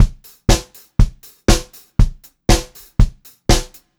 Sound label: 120 pop beat, Pop-Rock Drumloops